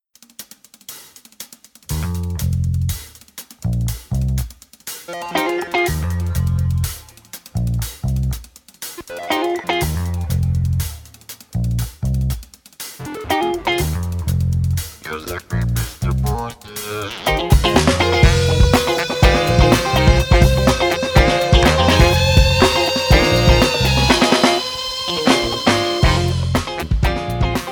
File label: creep funk